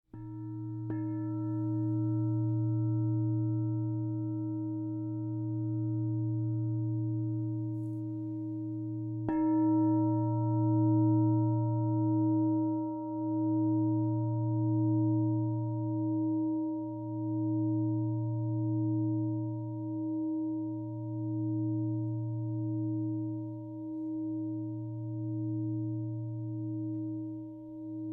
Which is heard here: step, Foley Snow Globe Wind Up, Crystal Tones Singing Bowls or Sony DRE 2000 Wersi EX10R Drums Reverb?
Crystal Tones Singing Bowls